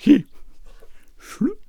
Bard Attack Vocals

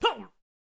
Boxer Death Vocals

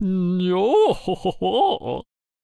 Chariot Attack Vocals

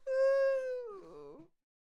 Peasant Death Vocals

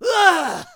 Samurai Death Vocals

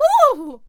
Halfling Attack Vocals

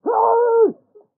Executioner Attack Vocals

